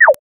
magical blink drop bing

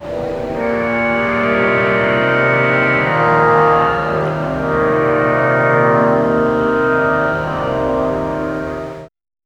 over the top mughal court

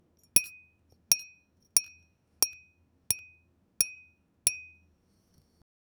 smooth asmr sound of slic, acrylic

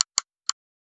a satisfying button click; ui ux

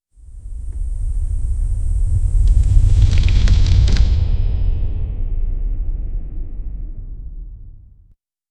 abrupt wind stop; slowdown; trailing

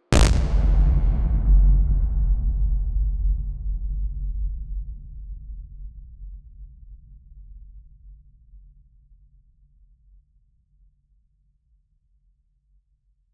braam, funny; lol kek cheurek

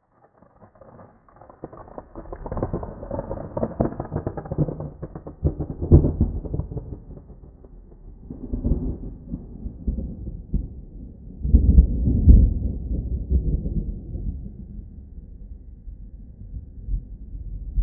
thunder in an open field

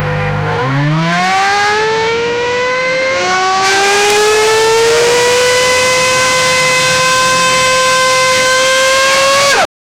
formula one car revving i

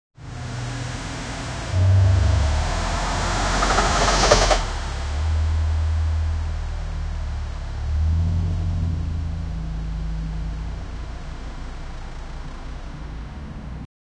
sports car start; automobiles

